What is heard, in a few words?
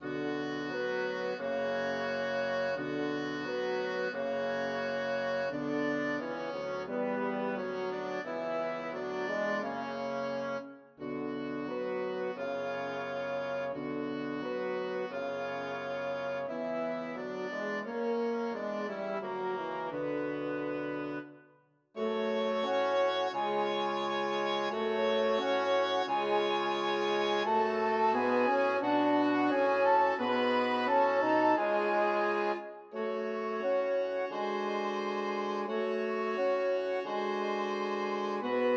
Aases Death 5tet